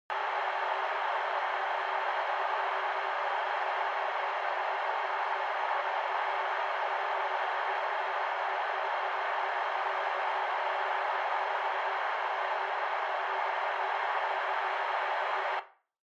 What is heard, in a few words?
Megaphone Noise Room Static